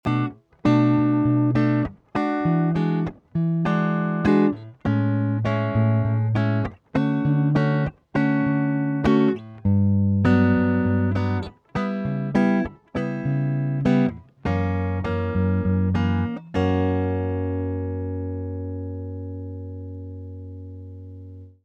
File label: Chord Progression Tension and Release